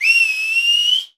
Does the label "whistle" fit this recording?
Yes